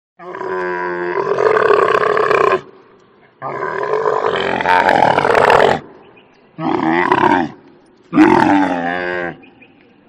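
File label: sound of camel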